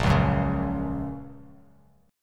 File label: A sharp Minor Sharp 5th, down, Bass Standard; strummed; JGuitar